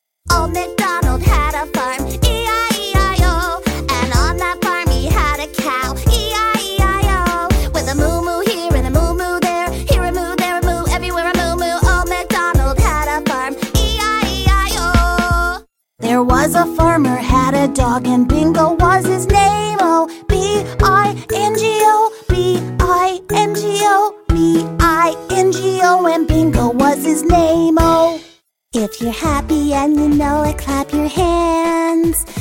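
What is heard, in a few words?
American, songs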